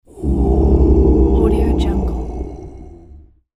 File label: low monster growl